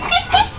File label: kiss kiss